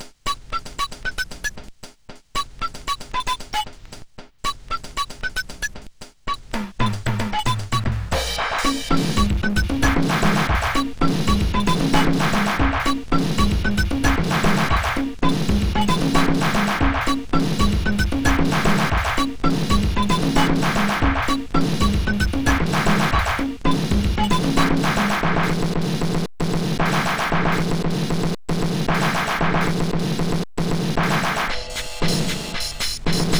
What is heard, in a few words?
drum data, Drum Studio